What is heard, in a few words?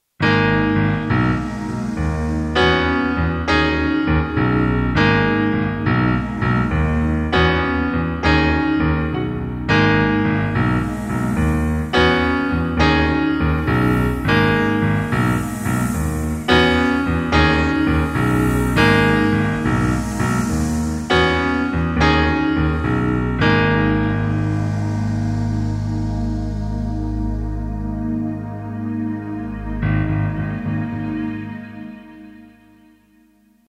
rock riff